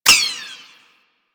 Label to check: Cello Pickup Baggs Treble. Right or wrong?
Wrong